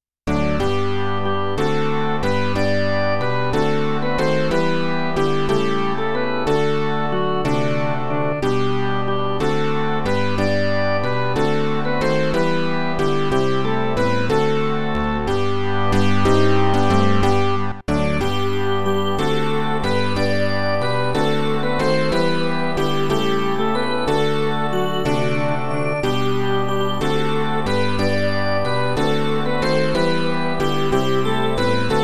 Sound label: midi